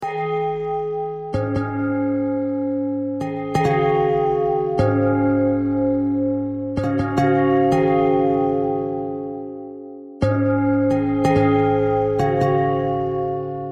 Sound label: bell bell thread simple, bells